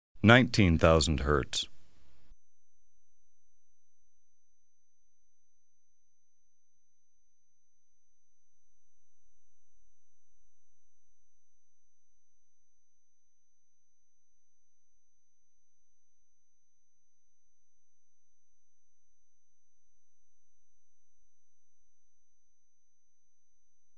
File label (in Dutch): Een sinus van 19 kHz, -10 dB